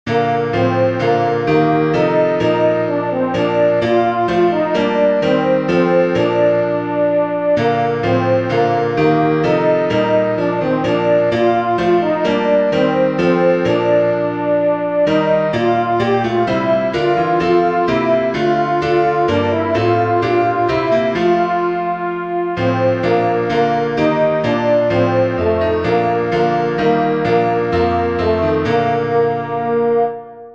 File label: st theodulph alto; by Melchior Teschner (1584-1635)